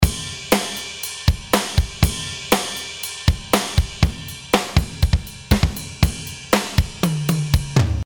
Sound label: DRUMS